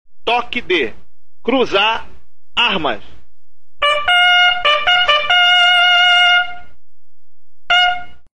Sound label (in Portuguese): cruzar arma, toques de corneta; Cruzar Armas